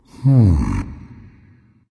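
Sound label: thinking, nonverbal; smite; voicepack; khepri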